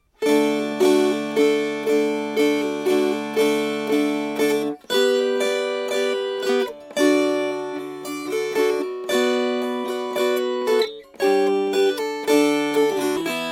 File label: Strummed